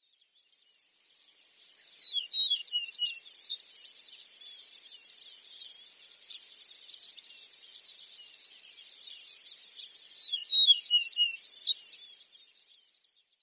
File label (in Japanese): shimaaoji; sarobetsu